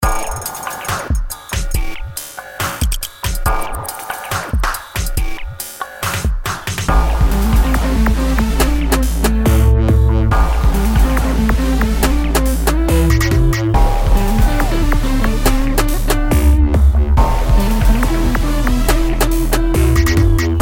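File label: Dubstep ringtone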